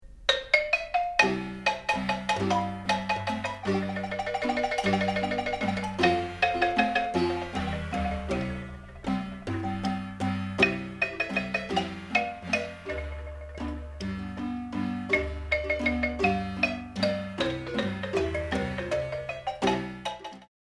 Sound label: marimba